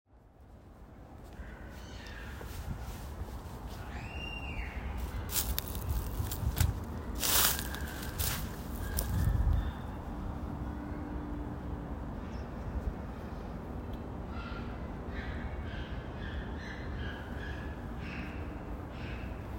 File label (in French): carte postale sonore centre de nature